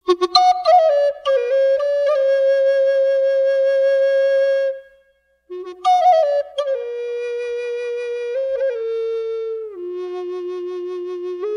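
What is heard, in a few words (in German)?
Fis ohne Effekte, drone flute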